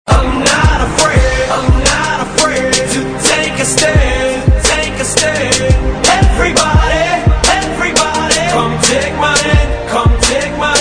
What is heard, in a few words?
win